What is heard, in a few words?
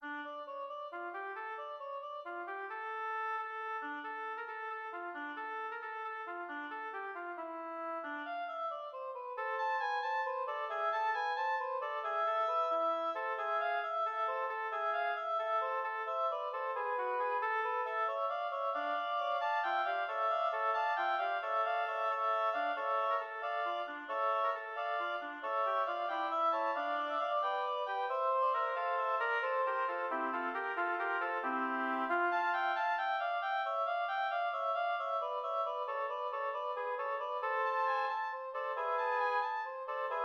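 fugue oboe trio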